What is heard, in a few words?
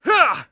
throw; UFC Tapout